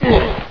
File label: fall; quake2; necro